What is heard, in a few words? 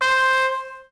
Trumpet tone; game rip; Lego My Style Preschool